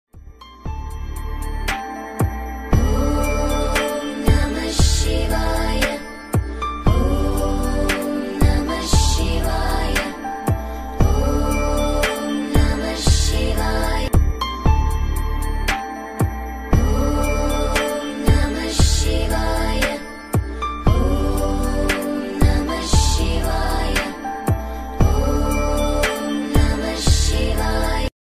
Om Namah Shivaya Ringtone

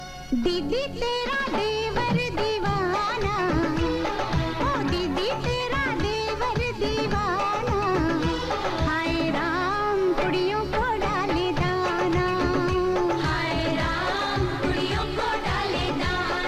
Hindi Old Song Ringtone; ringtones

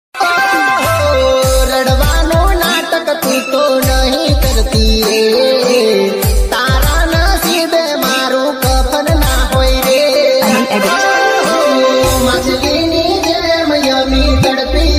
Gujarati Ringtone

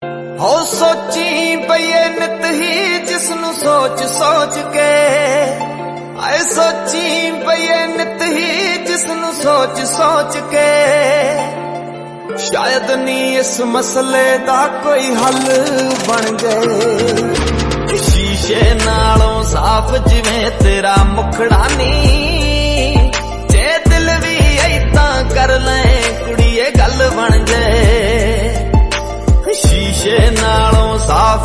Punjabi Ringtone

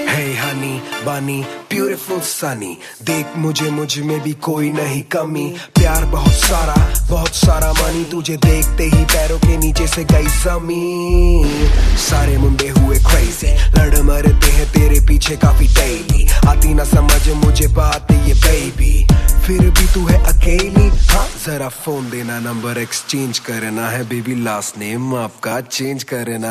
Remix Ringtone

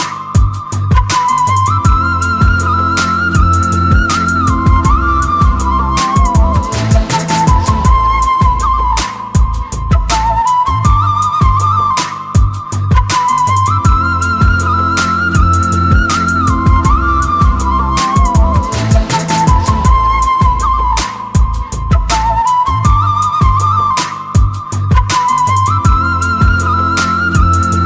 Romantic Flute Ringtone; ringtones

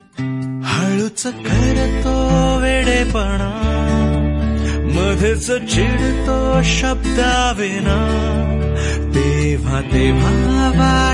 Marathi Ringtone

